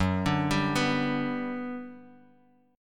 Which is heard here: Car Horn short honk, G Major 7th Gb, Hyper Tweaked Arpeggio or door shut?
G Major 7th Gb